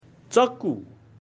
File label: knife; grade1; objects at home